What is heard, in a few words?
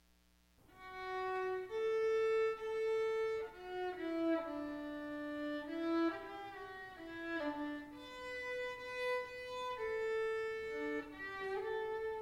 violin; as the deer